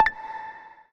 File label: gambler level up; Magic Stars 5; Wazdan